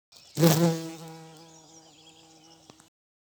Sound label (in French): Bourdons ultime; Vols Bourdn abeilles